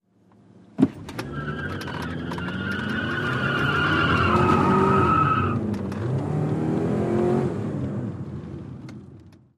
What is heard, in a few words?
Sedan Midsz Peel Out